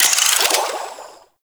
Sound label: Water Sprayer Alt Pump Load; Warframe; Weapons